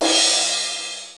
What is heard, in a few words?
DDD5 CRASH, drum; Crypton - DrumBase5000